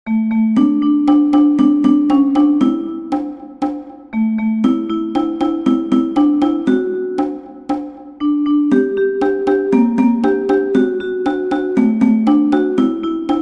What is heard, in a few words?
If you re happy and you know it - Cajon